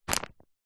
household plastic pillow or duvet bag grab pick up